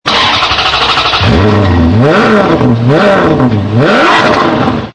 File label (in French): quattroporte démarrage, maserati